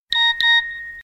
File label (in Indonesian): notifikasi whatsapp pendek; nada dering nokia jadul